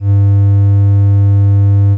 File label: Triangle Wave